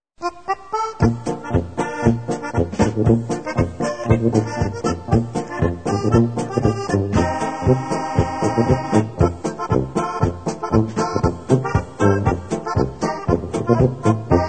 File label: TICK TOCK POLKA